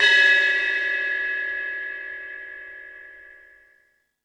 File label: Fusion Stix, E-MU Pro-Cussion; drum machine